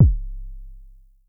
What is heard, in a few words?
K 808 ish, Vermona Mono15 Drums Kicks